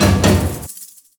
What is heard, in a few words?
Powerup Samba Burst Activation; angry birds rio